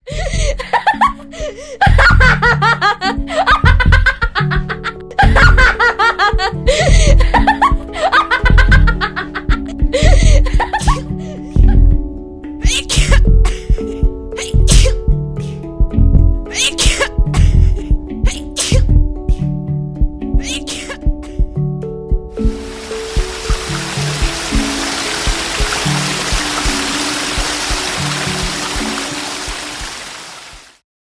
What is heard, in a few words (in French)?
hang